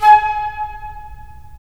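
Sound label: FL, flute; LA Composer vol. 3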